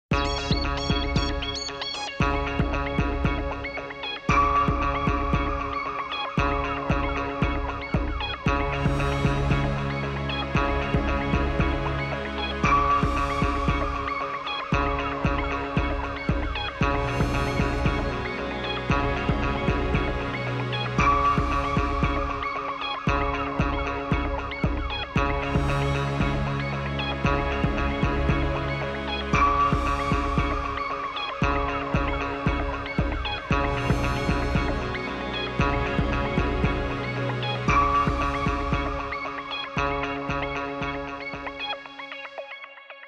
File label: Social Documentary